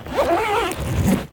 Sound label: backpack zipper; Golden Crayon Sounds